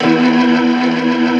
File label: E2, Keyboards Old School; USB Soundscan vol.09; AKAI